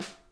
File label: SNARE, Interactive Drum Kits; Sonic Reality